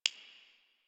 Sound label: Messenger Hover; Ambience; Warframe; Nineteen Ninety Nine